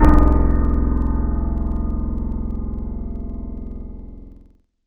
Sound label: PIano Low, Wavetables; Synthetic's Serum Collection; Standalone-Music SYMPHONY Wavetables